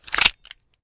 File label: reload empty mag in